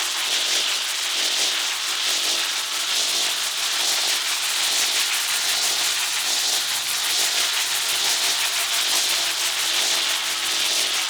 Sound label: Ship Shield Beam Loop; Corpus Ship